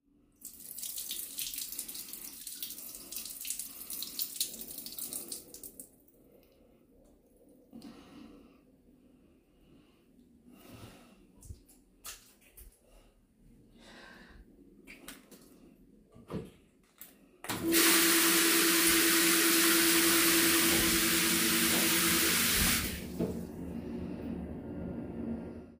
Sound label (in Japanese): お風呂で オシッコですッ